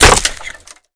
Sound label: impact wood; Modern Warfare